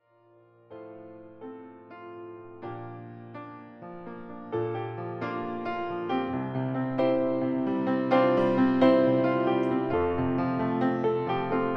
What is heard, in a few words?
Piano